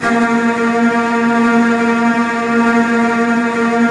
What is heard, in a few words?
Virtuoso Strings, TRM FX